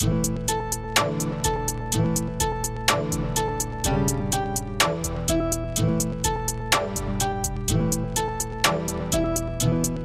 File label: Creepy Music